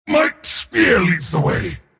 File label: move pain; Mars